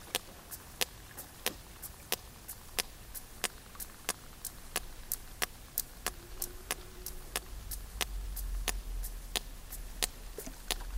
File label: Physeter macrocephalus